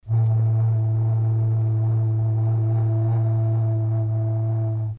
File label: Bass Balloon Flute